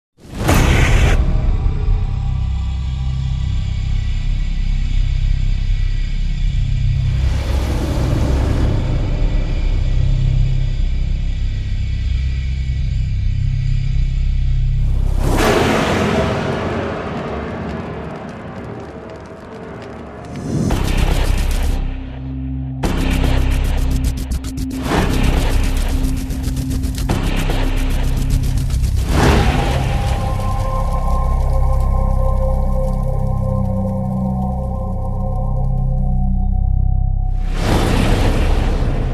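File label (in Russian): Страшные звуки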